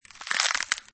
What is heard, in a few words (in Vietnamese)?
gay xuong xe rach